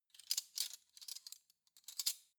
cutlery spoons handle

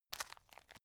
tissue package pick up

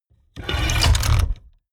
mechanics medium trail

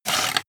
sword scabbard handle short

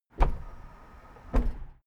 car lift down

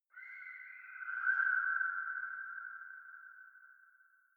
whistling chirping

